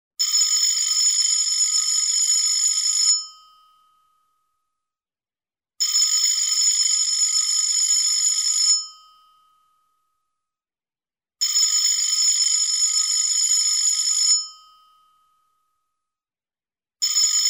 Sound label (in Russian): phone